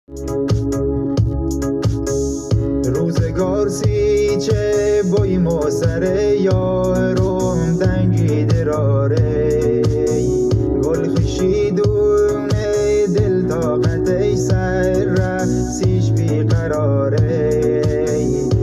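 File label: Lori romantic music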